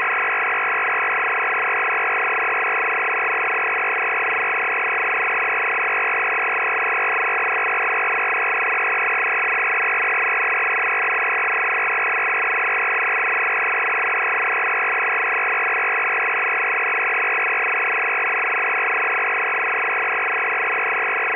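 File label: Japanese 8 FSK 200Bd, JAPANESE NAVY 200 Bd 8-FSK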